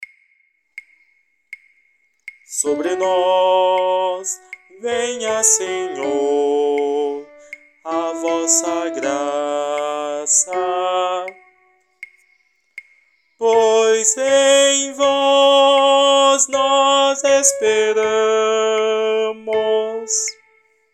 Salmo coro; Sl 32; salmo responsorial 32 33

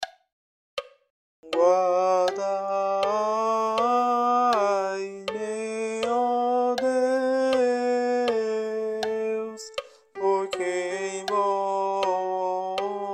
Salmo coro

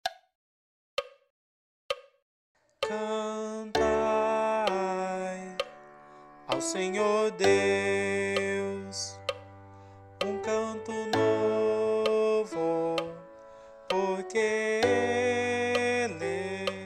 coro imaculada

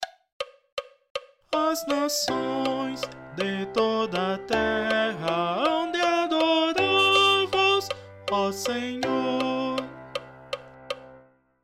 Epifania do Senhor CORO

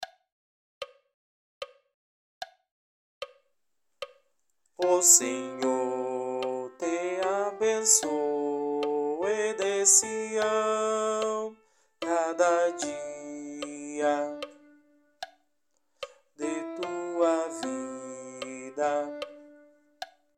salmo 127 coro

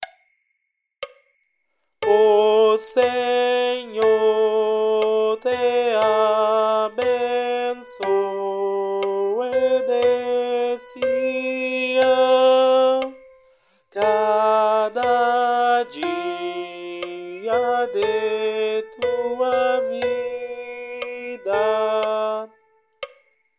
Salmo coro; Salmo 127

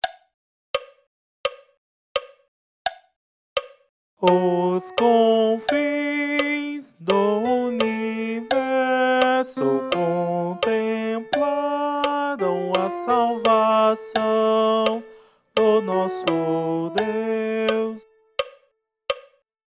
Natal Missa coro

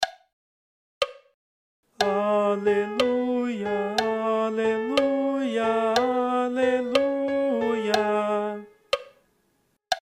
Aclamacao Advento coro; 2o dom

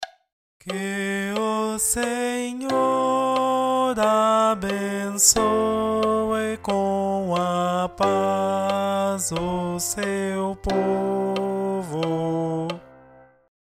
Batismo coro

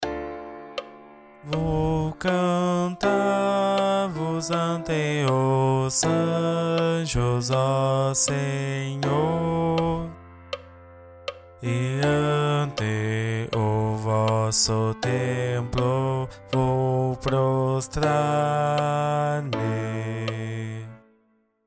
coro

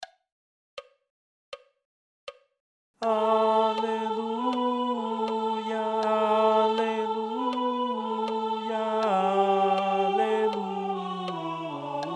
Aclamacao coro

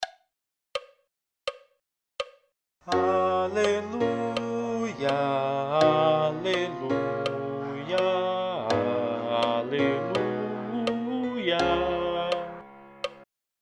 Aclamacao coro; 3o dom

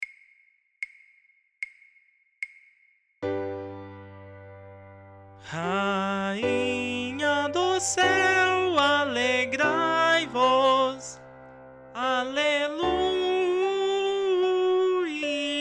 Vozes Rainha dos céus

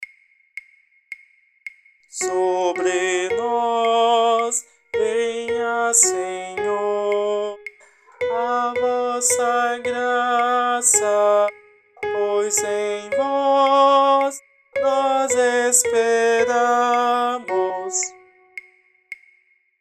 Salmo coro; Sl 32; salmo responsorial 32 33

